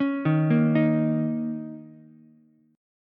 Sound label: A sharp Minor Double Flat 5th A sharp, Ukulele; arpeggiated; 6,3,6,6; JGuitar